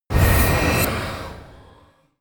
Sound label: magic spell float start; swooshes-swishes